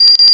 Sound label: bitsy beep; ericschwartz; qualitytime